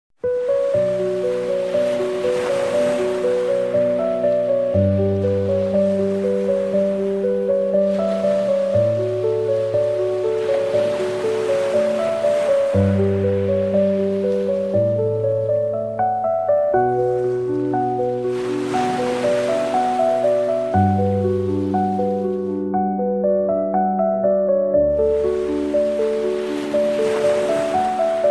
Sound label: sea and piano